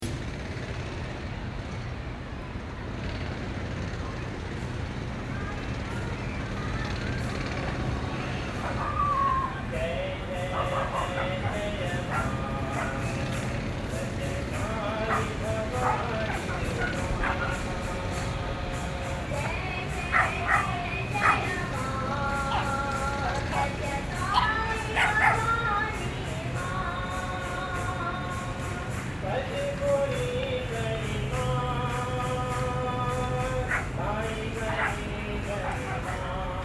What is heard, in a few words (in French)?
Ambiance Ville Avec Une Prière